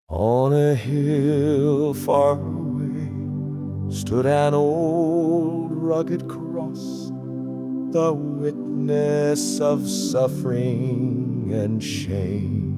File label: Male Voice, Hymn, Piano; Old Rugged Cross